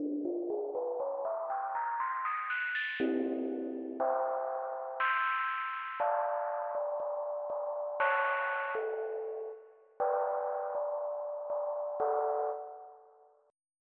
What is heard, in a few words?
Iceblock, Soundfont; Onj's Soundfont